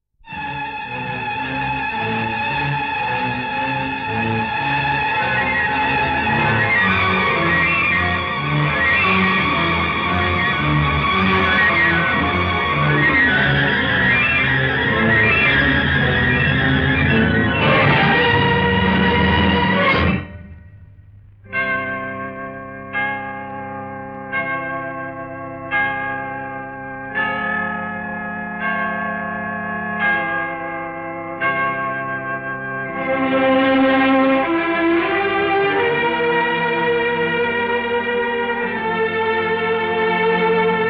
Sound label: Instrumental